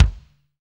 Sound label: BD, Roland; Sound & Vision - Gigapack I